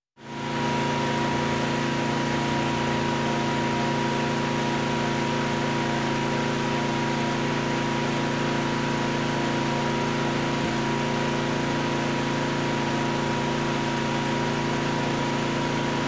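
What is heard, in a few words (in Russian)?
звук заведенной газонокосилки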